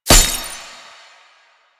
Weakpoint Impact; Enemies; Lasrian Tank; Warframe

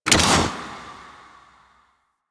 Pistol Stop; Warframe; Infested

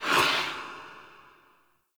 Shawzin Score Window Close; Warframe

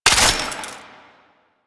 Belt Fed Rifle Pre Fire; Weapons; Warframe; Tenno; Frumentarius

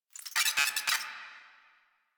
Space Drone Pain; Warframe; Corpus Space Drone

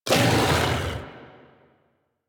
Kubrow Armored Vocal Attack Medium, Enemies; Warframe

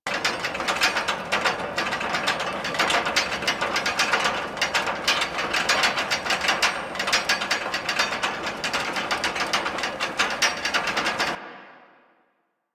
Rover Rattle Loop; Warframe; DE; Vehicles; Venus

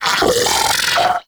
Arachnoid Vocal Player Spotted; Warframe; Corpus; Arachnoid Hunger

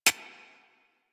Decoy Counter Measure Mech

